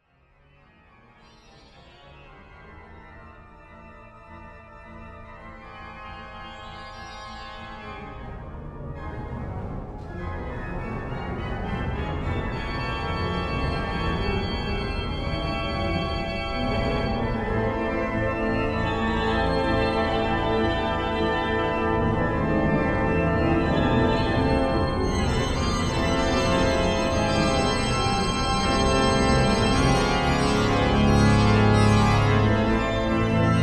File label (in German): improvisation glocken, Furtwängler Orgel; jesus bleibet; ave maria